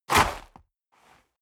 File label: step boots gravel light jump